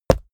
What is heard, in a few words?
step walk stone; schritte